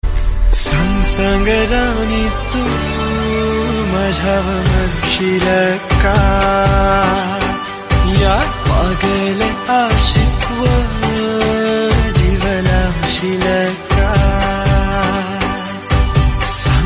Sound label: Marathi Song Ringtone